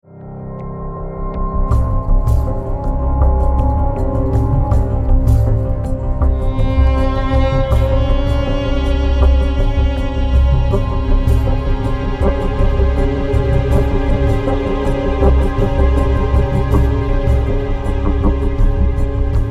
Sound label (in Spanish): etnico misterio investigacion